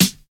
Crisp Snare One Shot F# Key, steel snare drum tuned to the F# note